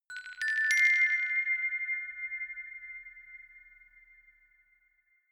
notification glockenspiel rattle triad up diminished